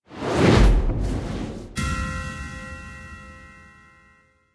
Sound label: no Win Respin Intro; arcane